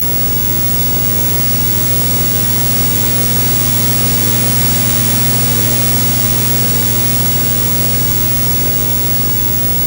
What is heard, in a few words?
line noise